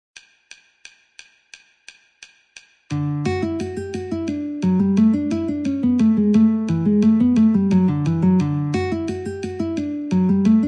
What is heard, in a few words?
Guitar metronome 88